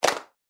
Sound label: Hands Clap